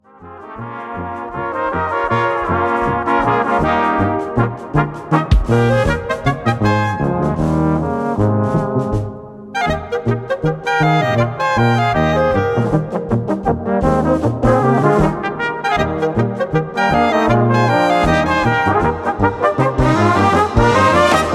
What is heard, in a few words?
polka